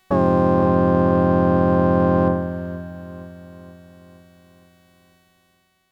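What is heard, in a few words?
Piano Chord with Reverb Trick; CRAFTsynth 2.0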